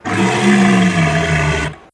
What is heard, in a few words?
t-rex roar